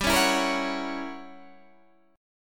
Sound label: F sharp 7th G, chord; F#7/G strummed